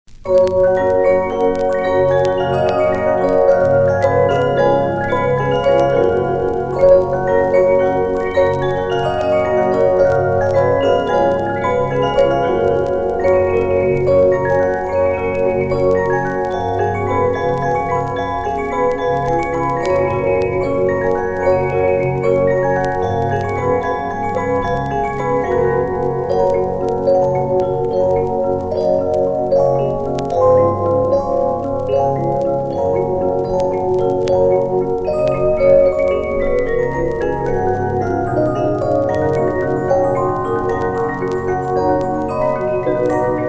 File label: Old Music Box Waltz Melodies, Music Box; Bornand Music Box Collection